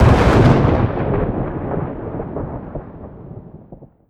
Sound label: bolt huge thunder